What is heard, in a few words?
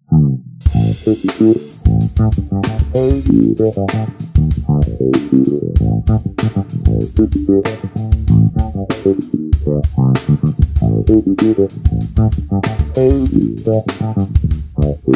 Groovy Bass Improvisation